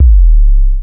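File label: bass drum deep, drum machines; 300 Drum Machines; E-MU Orbit-3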